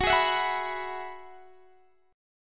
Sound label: Gb 7th Suspended 2nd Suspended 4th Gb